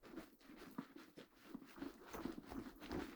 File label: backpack rustle; human; NS Studios - ultra sound collection